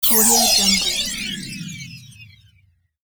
descending zap swipe; sound ideas

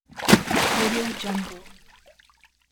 splash water

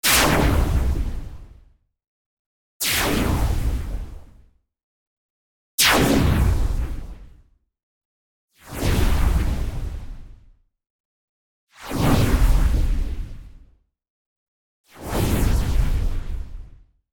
futuristic air spell

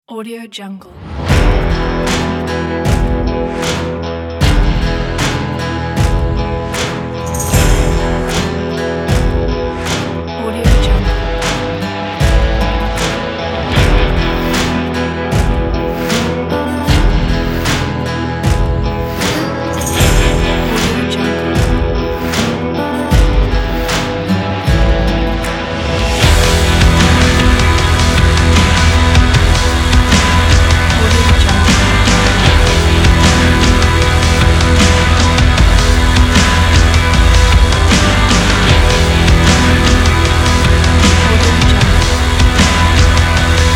western stomp rock trailer

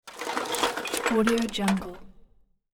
search through garbage or junk pile; hollywoodedge

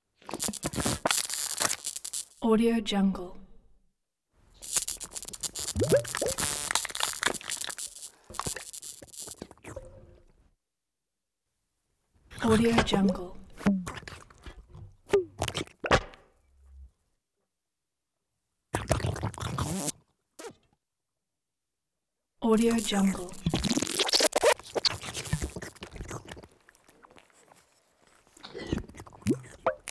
neuromuscular stimulation slurping gurgling; prosoundfx

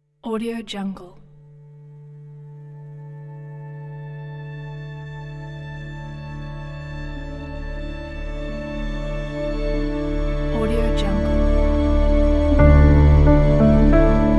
cinematic beauty

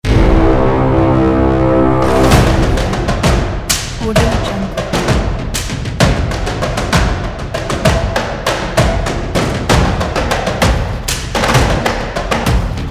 cinematic epic war drums

